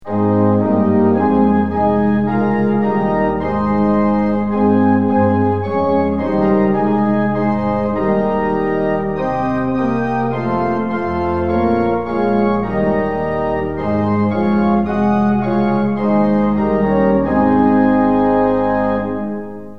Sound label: tune; hymns; lillenas